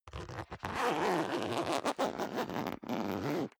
zipper down jacket open unstable; objekte; reissverschluss

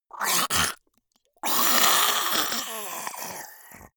monster choking zombie idle high; comedy-cartoon; soundpool

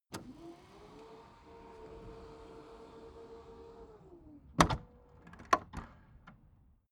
car trunk close automatic

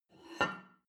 plate take table slide, gastronomie; soundpool

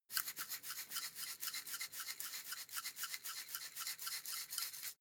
herbs shake; soundpool

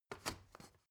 step ladder wood scrape single

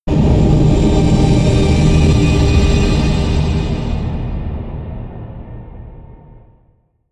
whoosh turbine swish; swooshes-swishes

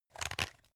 pepper mill slow; berufe; gastronomie; soundpool

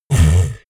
monster snuffle big

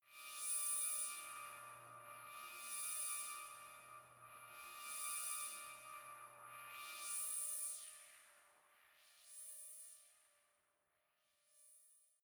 space horror wind chime

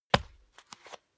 step barefoot wood parquet single right; schritte

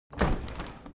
window handle open tilt hard; objekte; fenster

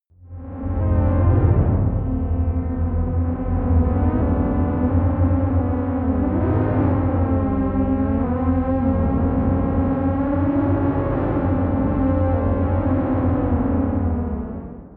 humming drone, drones

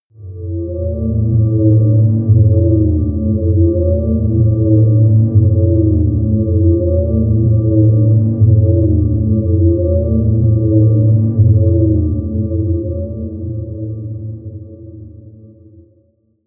drone noise; drones

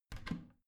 bathroom cabinet both open heavy; objekte; tueren

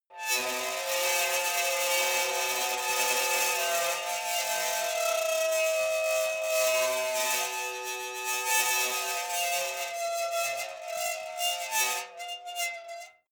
metal squeal sequence

